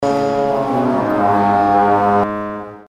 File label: pipe organ fx